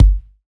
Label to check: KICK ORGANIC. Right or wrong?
Right